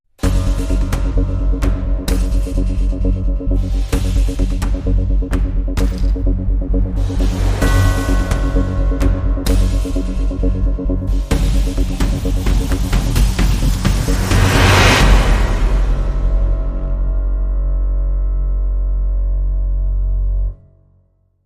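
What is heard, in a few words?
Dramatic Sting, No Orchestra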